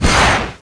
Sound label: doomsday; gusanos promode